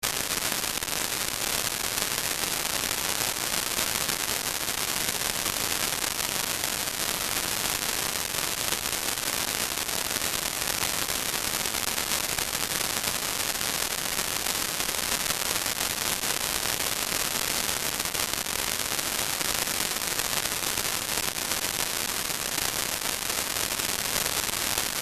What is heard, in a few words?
grainy noise low setting; NOISECORN